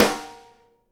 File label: snare GLASS, Live Snares